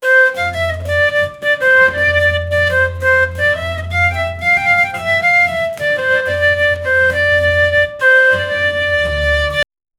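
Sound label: indian festival instrument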